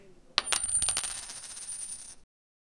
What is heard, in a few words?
sound of coins and money